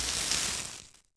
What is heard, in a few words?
Bomb Fuse; Civ4 warlords